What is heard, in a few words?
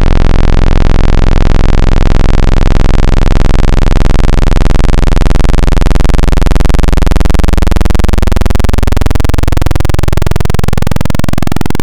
Analog PWM Square, wavetable